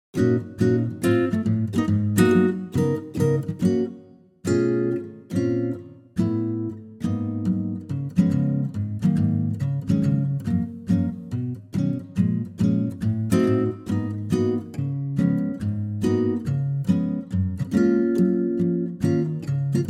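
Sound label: Gb acoustic guitar; key - Gb - vocal range - Db to Eb; stunning acoustic guitar arrangement of the jazz and swing classic standard, at a lightly swing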